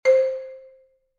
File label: tone